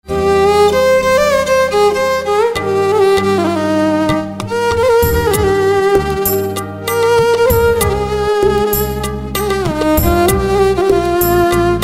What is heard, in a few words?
Violin